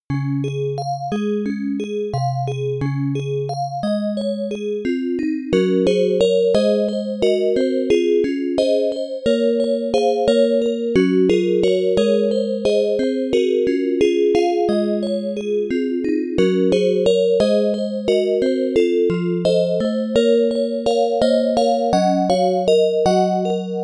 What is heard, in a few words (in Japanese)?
オルゴールバージョン; Kusuriyubi no Kyoukasho; PC-9801